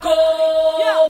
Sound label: "ho" female SUNG